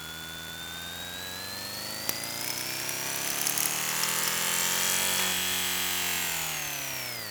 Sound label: knock